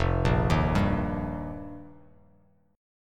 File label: F 7th Suspended 2nd Suspended 4th, Bass Standard; F7sus2sus4; arpeggiated; JGuitar